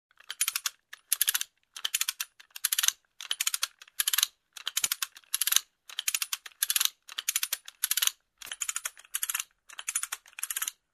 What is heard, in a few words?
Screwing a metal ring